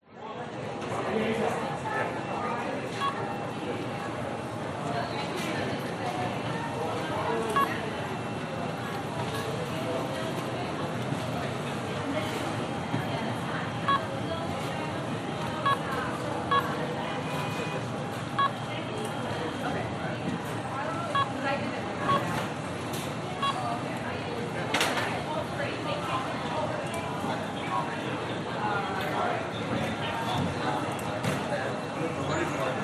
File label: Airport Check In Binaural; LAX; T5